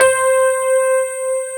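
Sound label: grand piano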